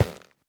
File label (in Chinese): 菌破坏声